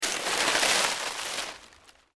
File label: LEAVES DEAD MOVE, Rustling